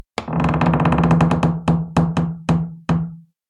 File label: Door squeak creak